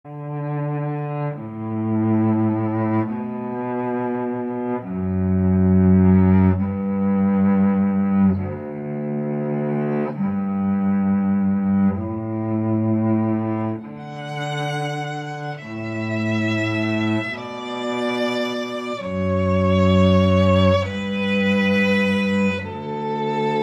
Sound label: Canon; Pachelbel